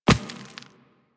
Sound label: Walk Rock, Footsteps; Warframe; Operator